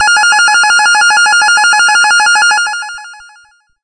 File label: bonus; VIDEO GAMES; carnival